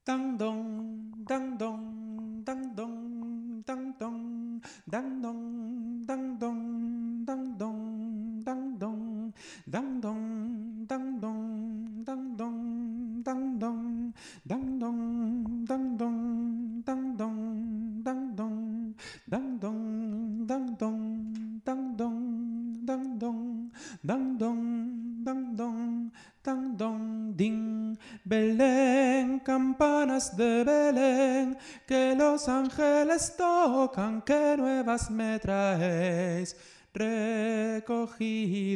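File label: campana soprano; tradicional; Campana sobre campana; (arr. Jesús Alonso); Navidad JOCSMAB 2023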